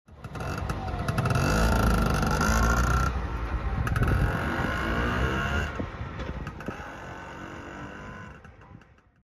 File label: mototaxi